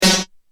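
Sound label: snare ad202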